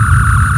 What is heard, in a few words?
laser